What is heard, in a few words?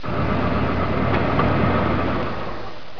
trucks